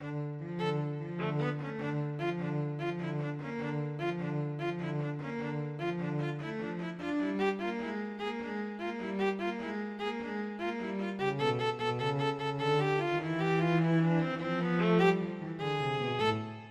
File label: viola cello duet